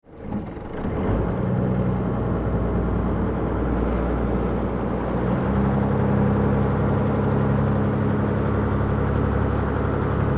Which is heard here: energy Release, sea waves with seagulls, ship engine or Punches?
ship engine